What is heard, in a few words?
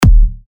TECHKICKS D, techno kicks; Shaundi; Revealed Techno Kicks Vol. 10